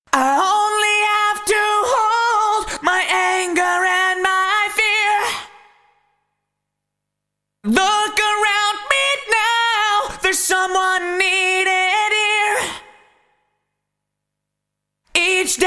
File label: Vocal Roland SRV 330 Plate; Vintage Digital